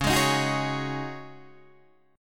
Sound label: C sharp Suspended 2nd Suspended 4th C sharp Guitar Open D, chord